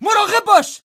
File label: charge, Voices Emotes